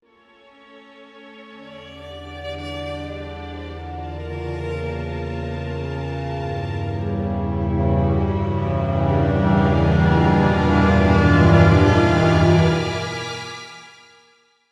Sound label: Tensionbuild; Among the Sleep